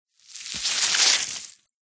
horror gore small organ flesh guts bloody fresh short movement